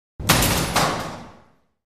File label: Bowling Ball Misses